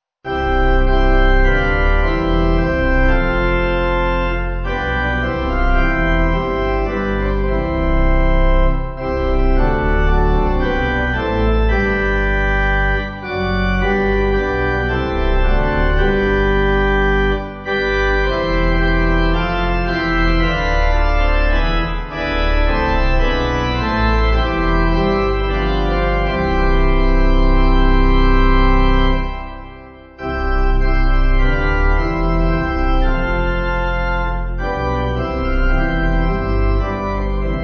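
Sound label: Pipe, Pipe Organ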